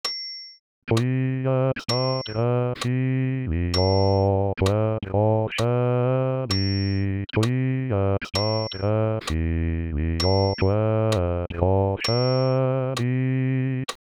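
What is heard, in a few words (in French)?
Credo basse voix seul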